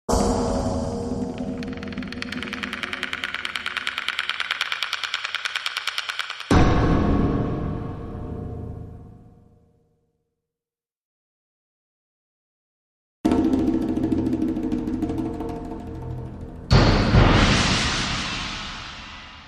Ominous Footsteps

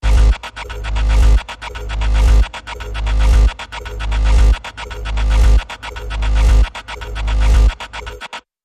Tmk Oscillation

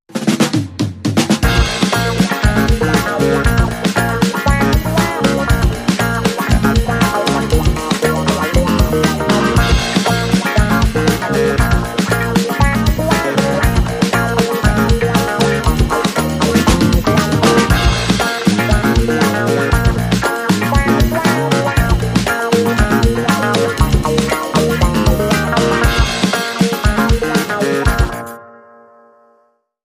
Afro Beat Fever

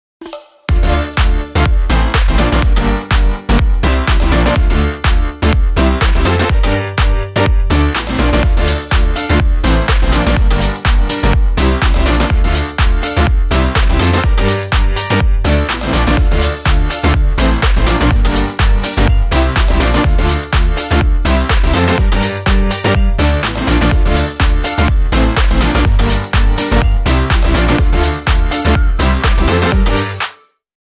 Tropical Whistle, Rhythm

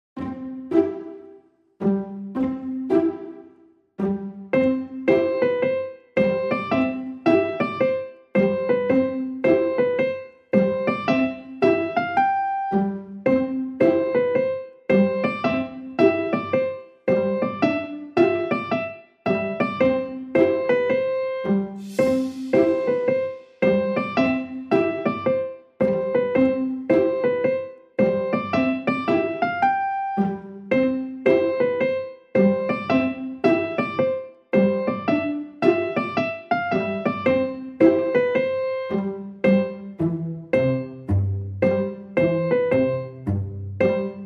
No Percussion and Whistles

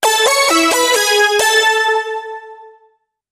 Crowd Control Sting

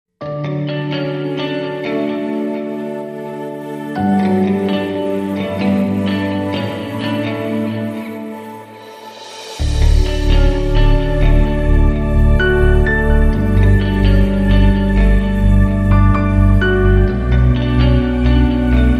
Heading Home, No Drums

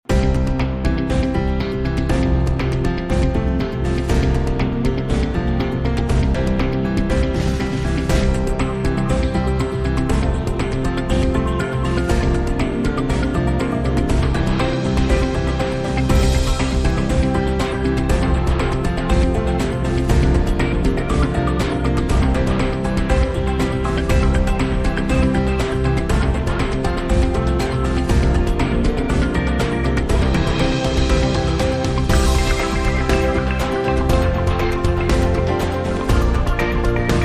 Without Lead Guitar

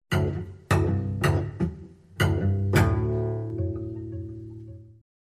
Cello Suite 2 Pizzicato Only

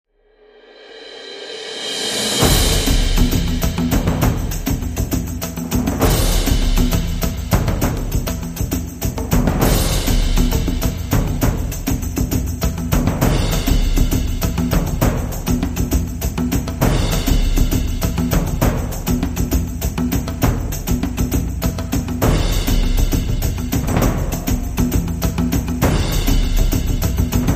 Epic Film Percussion

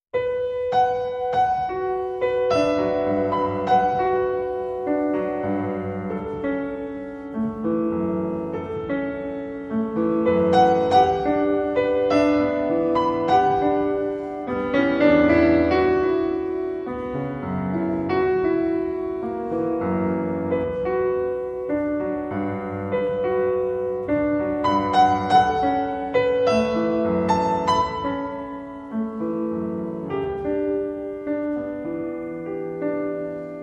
Only Piano

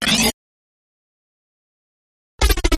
Quick Stutter Rise

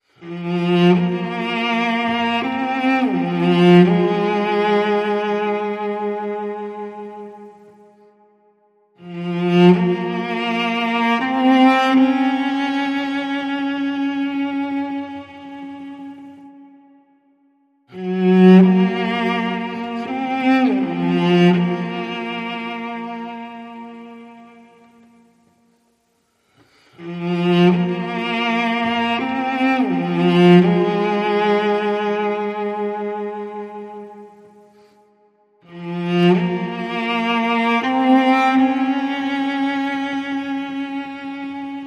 Cello

